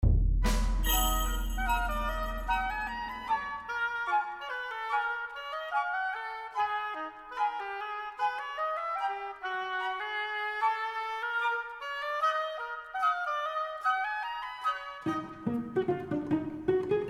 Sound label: Oboe Solo Adventure Theme